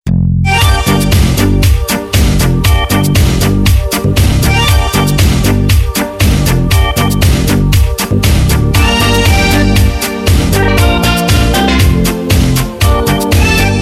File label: Korg Pa3X